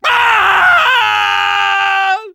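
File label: Soldier pain critical death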